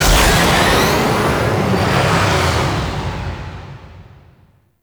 Ship Fighter Thruster Lift Off Slow; Warframe; Corpus Ship Remaster

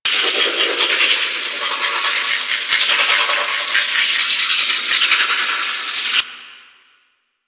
Tesla Ball Juggling Loop; Warframe; Trapper

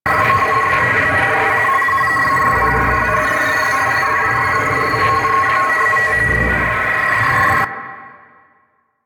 Cache Loop; Warframe; Nineteeen Ninety Nine; Exterminate

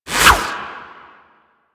Baton Whoosh; Warframe; Lasrian; Lasrian Soldiers; Weapons

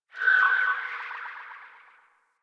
Gas City Distant Textures; Ambience; Warframe; Corpus Gas City

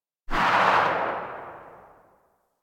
Tail, Pistol; Weapons; Grineer; Amphis

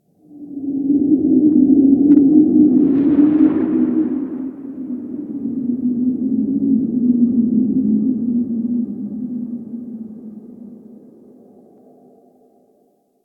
Interior Wind Howl, Ambience; Warframe; Duviri

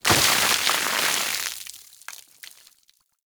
Water Barrel Ground Splash; Objects

